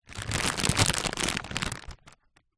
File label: food potato chip large packet movement; zapsplat